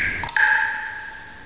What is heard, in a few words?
WATER